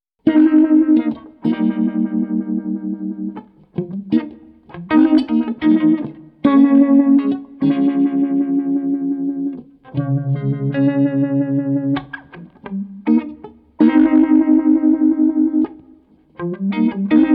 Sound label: strat; RetroVibe